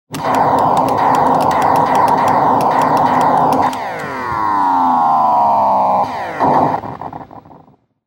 Technology Electronic Arcade Game Person Playing Game Dies